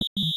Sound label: Referee; Samsung Galaxy S25 Ultra; Fun